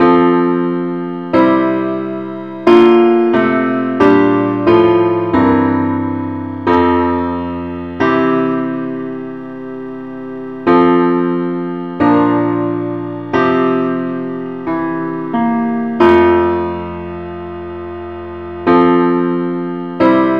parts only key f

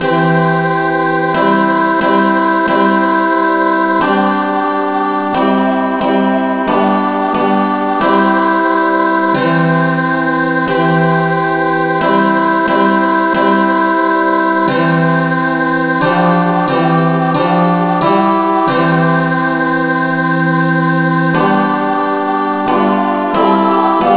henley no vocal key c

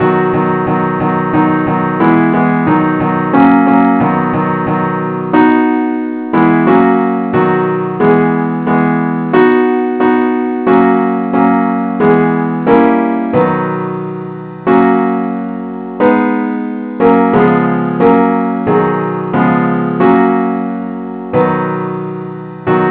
christe Sanctorum simple acc key b

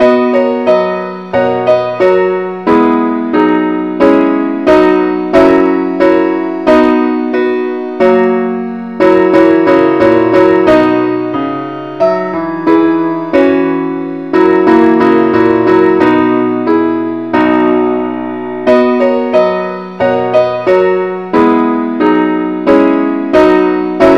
keyboard Only key b; Welsh tune; Gwalchmai is a Welsh tune, named for the town of Gwalchmai

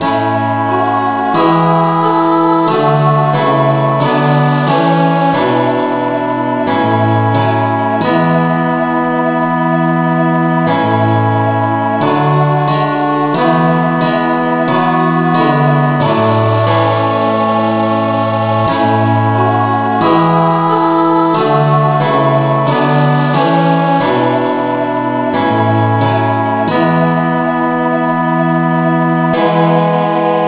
no vocal key a

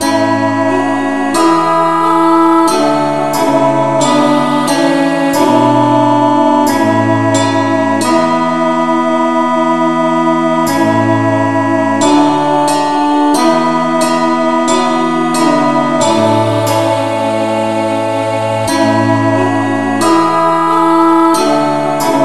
no vocal key a

